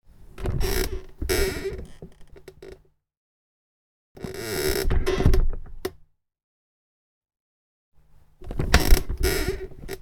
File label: sit down on an office chair; human; NS Studios - ultra sound collection